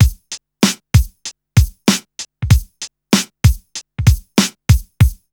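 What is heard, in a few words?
beat, talkbox; USB Soundscan vol.34; Burning Grunge Hip Hop; AKAI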